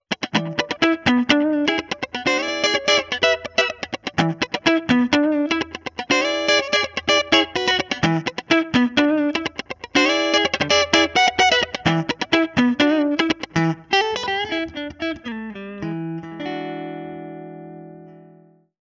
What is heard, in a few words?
Comp'd Clean